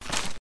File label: mp7 deploy, weapons